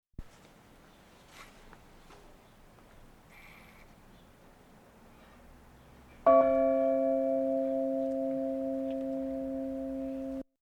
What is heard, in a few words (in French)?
cloche; Le Falgoux